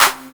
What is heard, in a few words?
CLAP, Dance Drums; Best Service ProSamples vol.15; AKAI